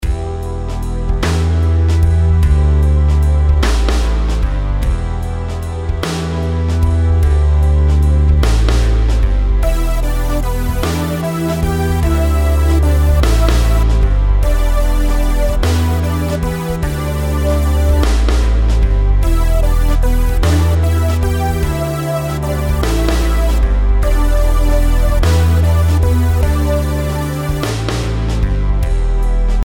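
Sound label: melodies compound 8s E minor; melody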